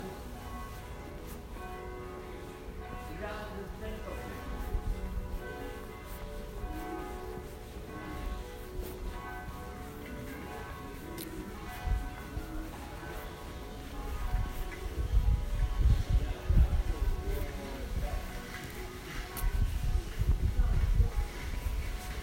Walking Down Oude Delft Street, Delft

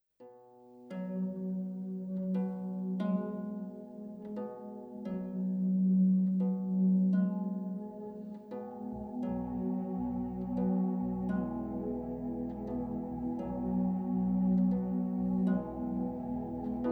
WARM YOUTHFUL

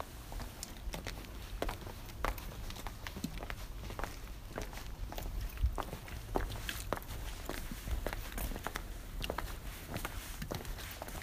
Walking Down A Quiet Cobbled Road, Évora

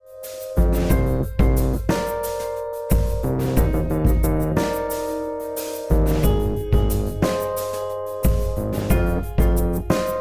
Rhodes; Production Pieces

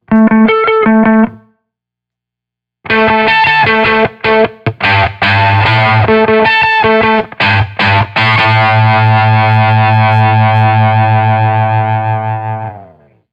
LP Octave Only

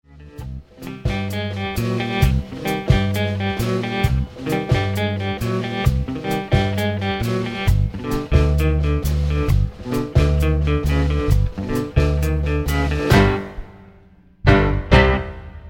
Tango; Rent

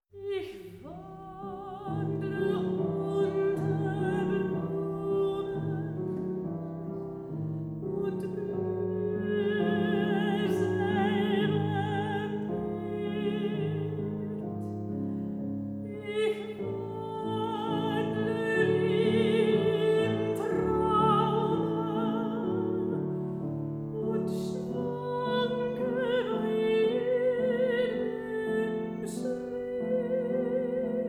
Songs For Soprano; Ich Wandle Unter Blumen; Alma Mahler

